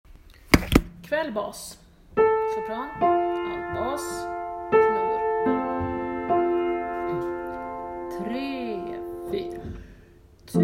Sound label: Kvall Bas